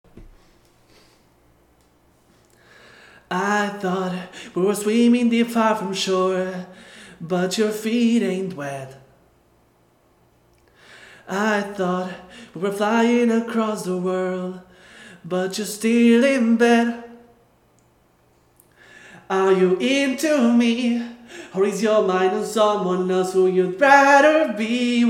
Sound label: vocal guide